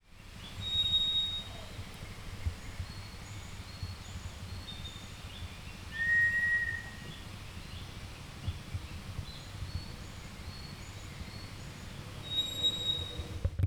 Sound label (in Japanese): toratugumi, bird song; トラツグミ; さえずり; 新潟県新潟市秋葉区 菩提寺山